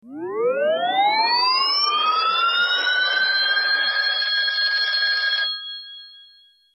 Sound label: Warped Ring, Ringtones